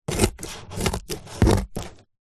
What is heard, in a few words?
office scissors cut cardboard several snips